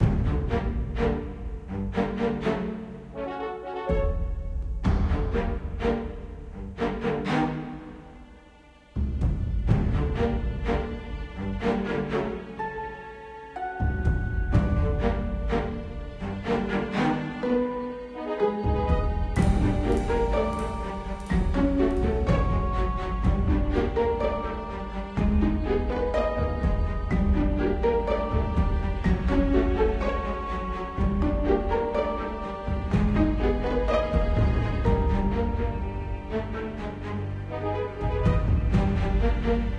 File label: puzzle music; Adera; Android